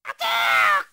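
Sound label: kick; Lee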